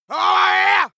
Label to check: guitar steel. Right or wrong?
Wrong